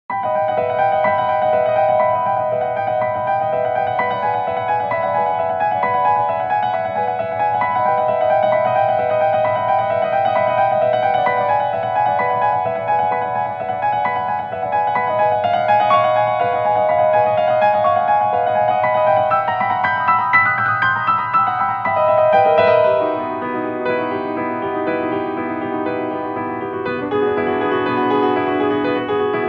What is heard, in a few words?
Nord Grand White Grand